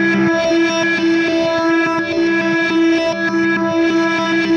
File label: Reverse Guitar; Sonic Foundry (Sony Creative Software); Indie Rock Anthems; Session 02 - Clinical